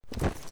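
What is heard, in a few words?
weapon foley javelin pickup lift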